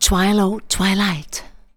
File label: vox, Techno Trance; Ueberschall